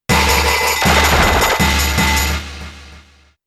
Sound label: Uproar; pokemon sfx gen 7 attack moves sumo usum